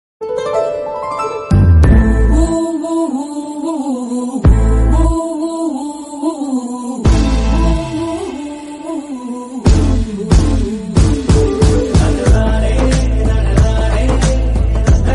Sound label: telugu movie bgm ringtone; most eligible bachelor